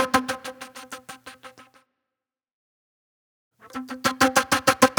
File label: Pop Flute